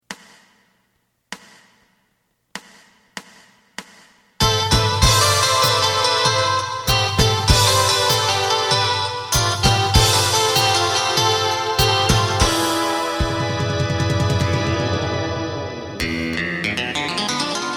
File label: bass